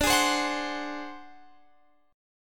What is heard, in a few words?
G sharp 7th Flat 5th D, chord